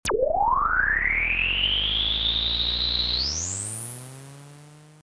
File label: Random Sound; Europa